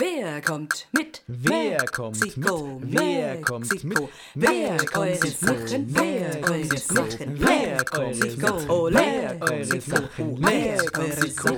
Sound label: Mexican Waltz